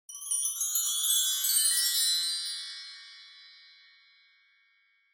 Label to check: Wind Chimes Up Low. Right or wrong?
Right